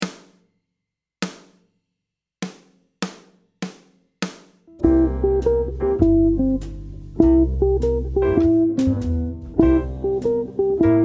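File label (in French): riff arpège, guitare jazz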